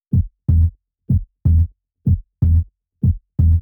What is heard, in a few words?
Bass Loop 124 F-